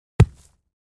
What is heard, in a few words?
Ground Hit Sledge Ham; rocks & dirt